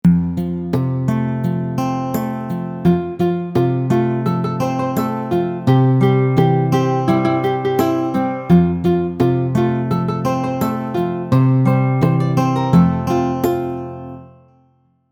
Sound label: Paw Paw Patch One Verse SLOW Tempo; ukulele; Rainbow Ukulele Play-Along